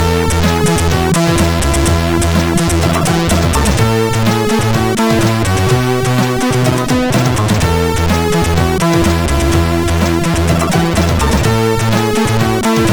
Balade, sid CSG8580R5

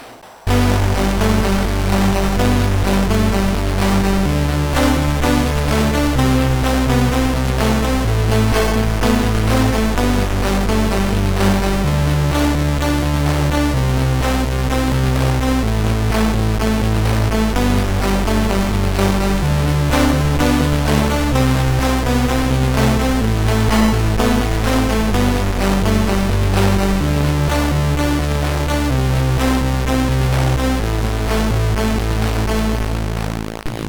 Noisemaster, CSG8580R5; Noisemaster 1

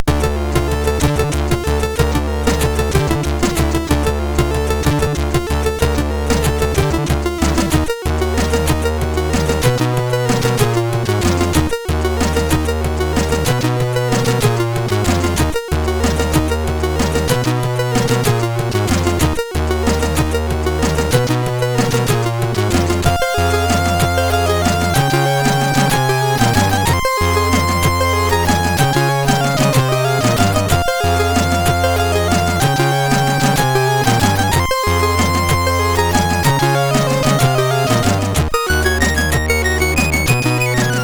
Waltz, MOS6581R3; hvsc

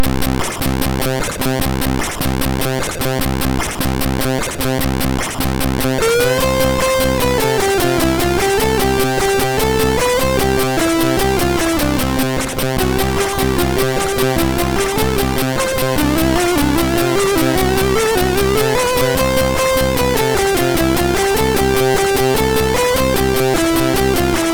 Train Music, MOS6581R2; hvsc; Sonic Graffiti

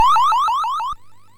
Trance, MOS6581R4; Trance Sector Ultimate

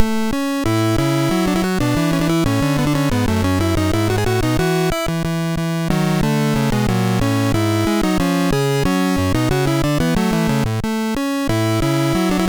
Dragons Den, MOS6581R3

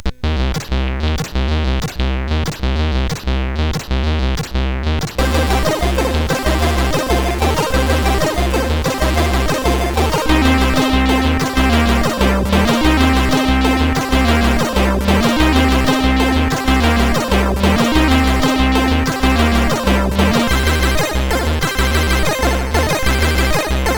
Street Jumpin sid CSG8580R5

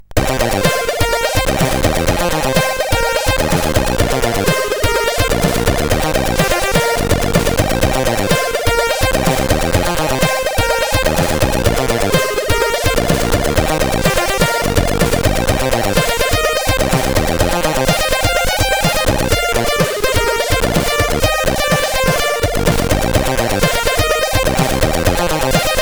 Remix, CSG8580R5

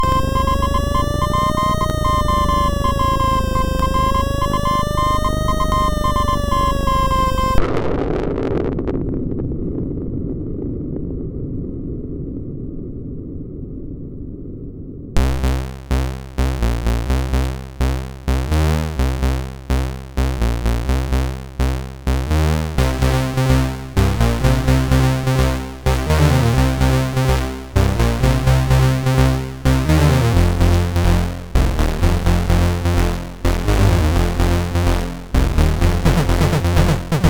Music Samples, sid, MOS6581R2

